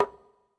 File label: Rollover